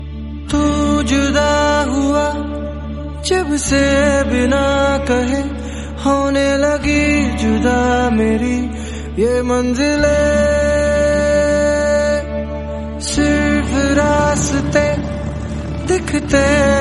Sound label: bollywood sad song tone ringtone